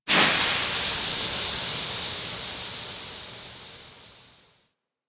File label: Bridge Steam Release; Warframe; Liset; Hub